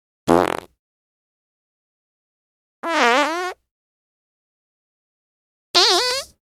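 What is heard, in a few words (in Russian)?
person farts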